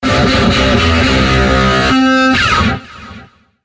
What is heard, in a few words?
Samsung Galaxy S 7 Official Sms